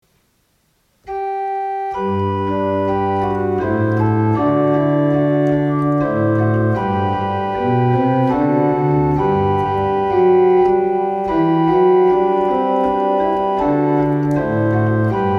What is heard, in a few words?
prelude; Zachow; Allein Gott in der Hoeh Sei Ehr